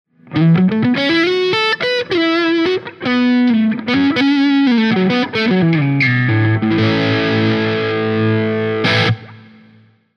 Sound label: dirty, humbuckers; origin; pickups